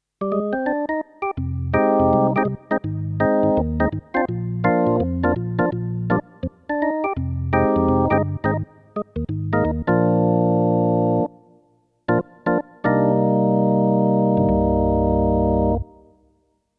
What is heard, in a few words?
Jazz Organ